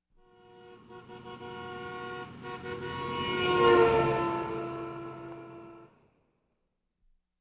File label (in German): vorbeifahrendes, hupendes auto; Auto-Zweiraeder